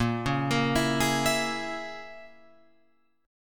Asharp Minor 7th Flat 5th Asharp down Guitar Open F; arpeggiated